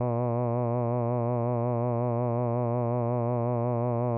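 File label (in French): A, un "a" d'une voix de basse, avec vibrato; AteliersHenrich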